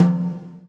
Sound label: GATE TOM, Drums Acoustic; USB Soundscan vol.10; AKAI; 90s sample CD; GATEKIT 2